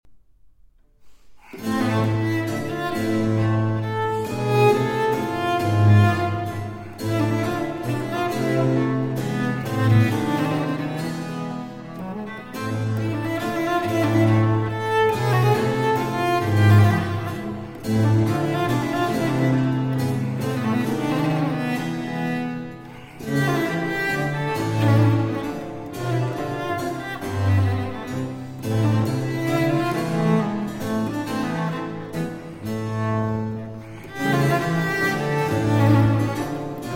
Sonata For Cello & Continuo in A Minor, Allegro assai